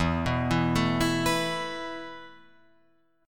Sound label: E Suspended 4th E, down, Guitar, Open F; Esus4; arpeggiated